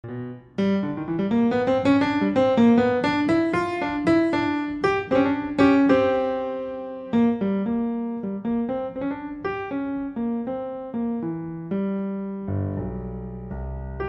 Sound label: Piano Jazz Solo